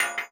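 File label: bullet case bounce 50bmg metal; gmod; viper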